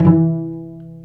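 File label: strings cello pizzicato, dry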